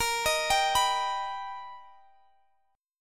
B Augmented Major 7th A sharp, chord

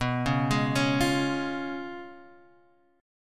Db Major B; arpeggiated

